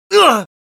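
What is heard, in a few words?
pain sharp, Spanish; Scout; Voice file from Team Fortress 2 Spanish version; Scout audio responses/es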